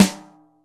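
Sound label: snare, drum kits; InVision Interactive; 40 Oz Phat Slammin Hip Hop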